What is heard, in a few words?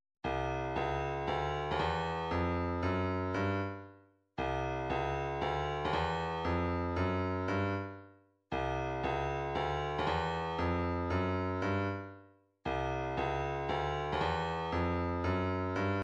116 cowbell